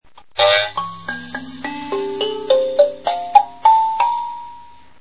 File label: small, kalimba